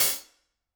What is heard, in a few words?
room Cl Hat, drum